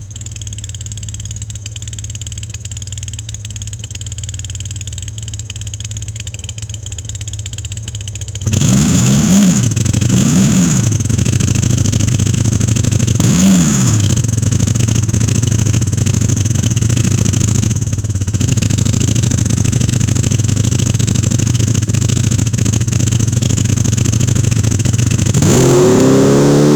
rapid acceleration of a, 1000cc

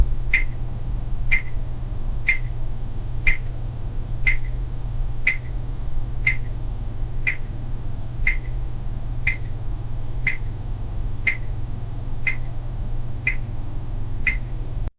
the sound of an incandesc, lighting

